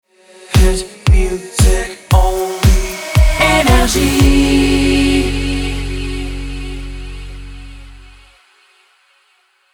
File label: Jingle Shotgun; NRJ